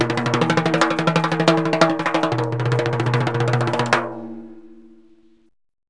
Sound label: timbales